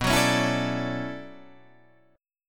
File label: B-Minor 11th B down Guitar Open D